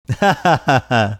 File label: interesting laugh